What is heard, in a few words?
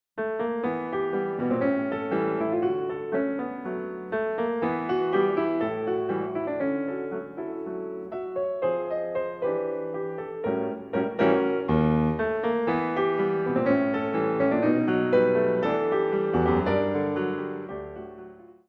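Cha Cha Cha, piano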